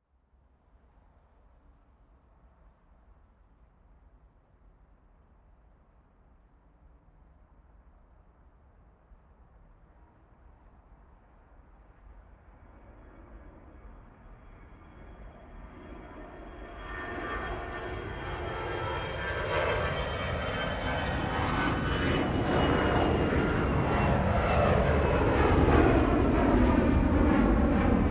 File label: planes takeoff; sonniss gdc